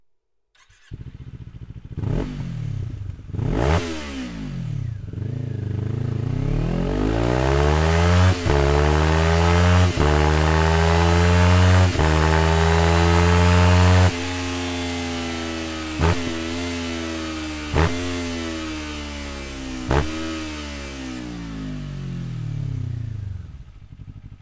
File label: slip on; akrapovic